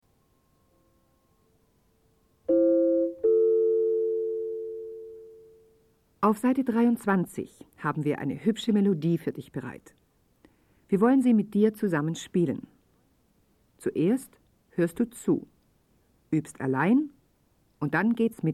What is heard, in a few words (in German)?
Spiel Blockfloete